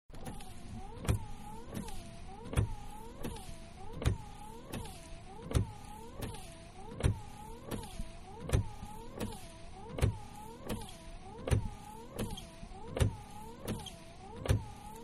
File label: Small Car Windshield Wiper Fast, Exterior; Cars; Vehicles